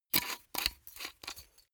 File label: glass bottle close aluminium lid quick